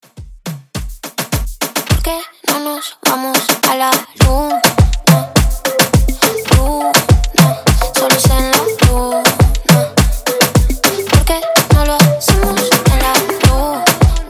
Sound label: Top40s Moombahton 104 BPM